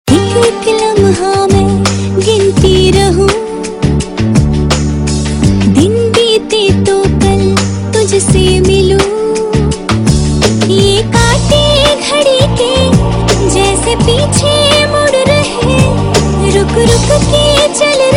Female

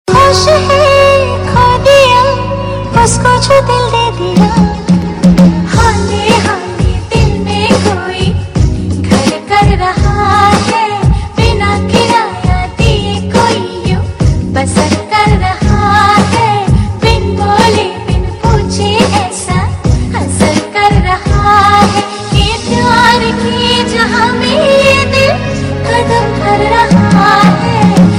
Female; Zee TV; Mrs. Kaushik Ki Paanch Bahuyien; TV Serial Tone

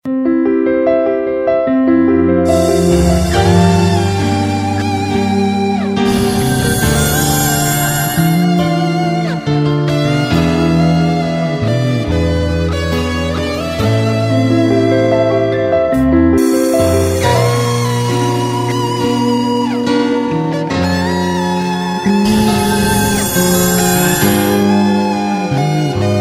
Sweet Valentine Tone

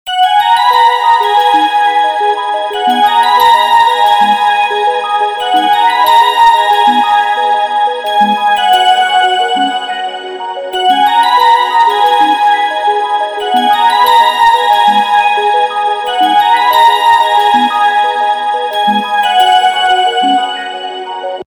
Instrumental Ringtone; Bollywood Hits (2014)

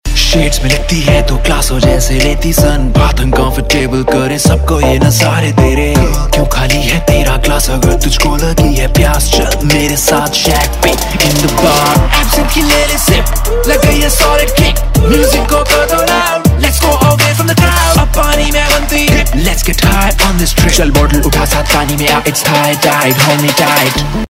Ringtone, Punjabi

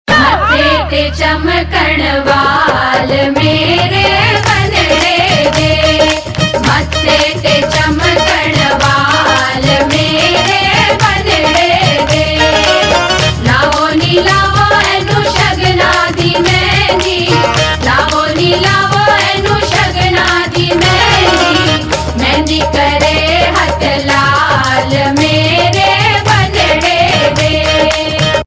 Punjabi Gujarati Sagai Song Ringtone; Bollywood; Patel Ki Punjabi Shaadi

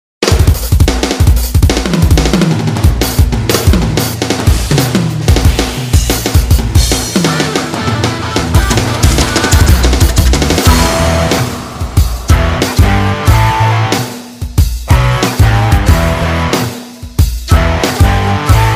Rock Music

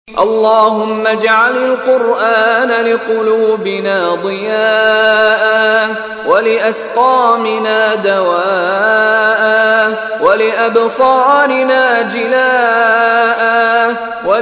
quran islam

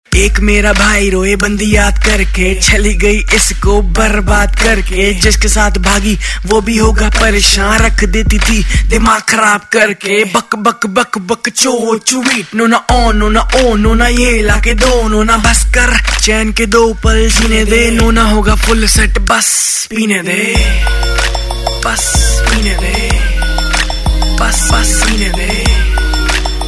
Rap Ringtone, Punjabi

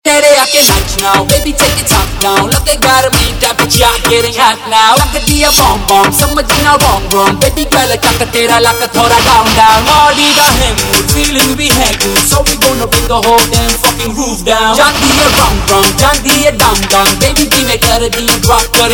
Ringtone, Punjabi

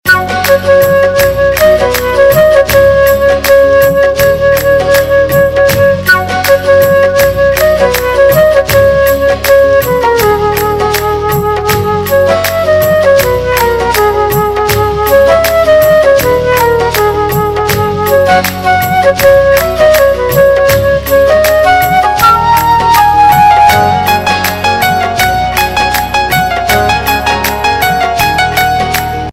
Instrumental Ringtone; Bollywood Hits (2014)

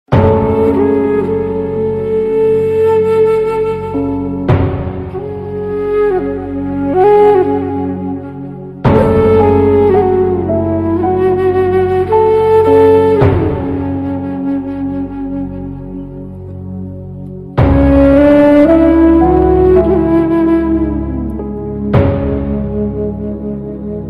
Sad Version; Kis Desh Mein Hai Meraa Dil

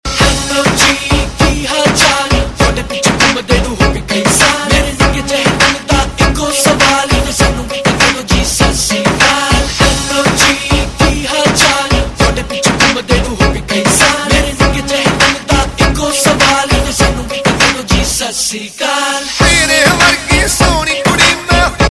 RAP Ringtone, Punjabi

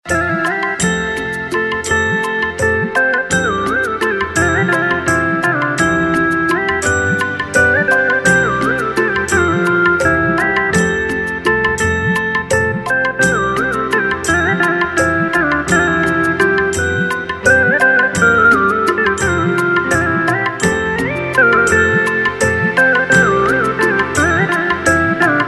Instrumental; Gandhi Jayanti

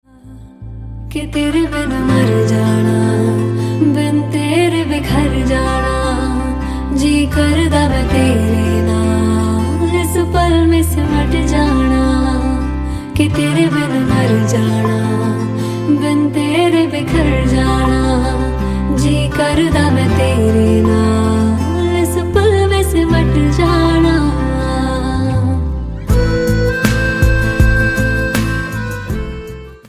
Punjabi Ringtone

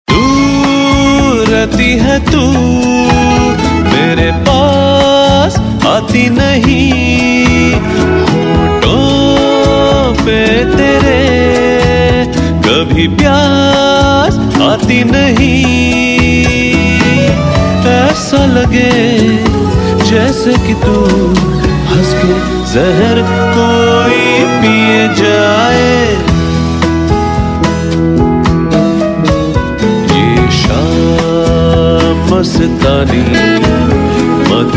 Unplugged Mashup Ringtone

